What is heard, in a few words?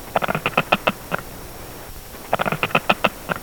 Vervet Snake Alarm